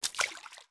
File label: FS Water, footsteps